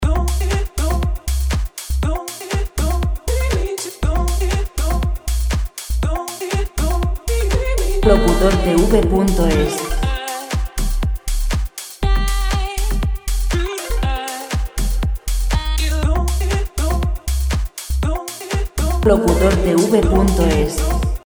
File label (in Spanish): musica libre 120 vocal; Música vocal sin copyright; Música vocal sin copyright para anuncios